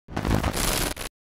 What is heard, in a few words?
Processing